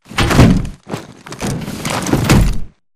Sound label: pilot embark casual above right; Titanfall 2